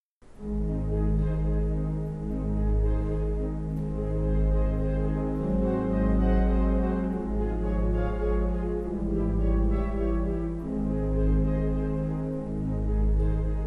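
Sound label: ave maria trumpet and organ; weddings; schubert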